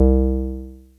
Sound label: BASS